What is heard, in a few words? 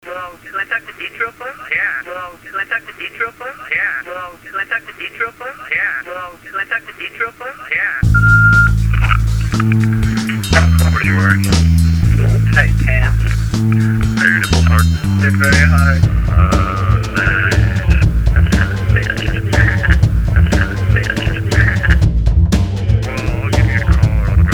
phone sex song